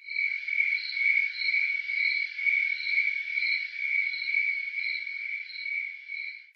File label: ambient cricket; STALKER